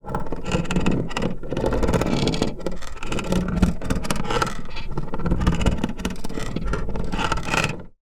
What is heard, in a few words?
sound design wood creaks reversed reverb weird